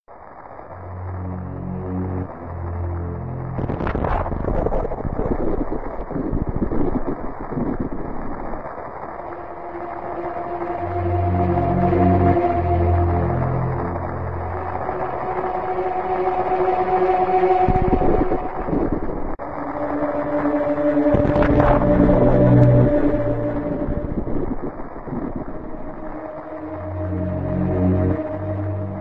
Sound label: Raining; Crateria